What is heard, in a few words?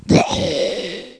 zombie pain; mystic radar; mr zombie1